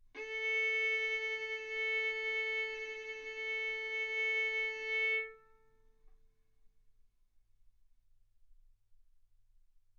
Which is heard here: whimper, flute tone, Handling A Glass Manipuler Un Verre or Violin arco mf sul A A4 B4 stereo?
Violin arco mf sul A A4 B4 stereo